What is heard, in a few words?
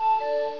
no move